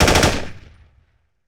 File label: AK-47 Short Burst; Weapons & Firearms